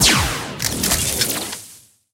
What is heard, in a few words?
hit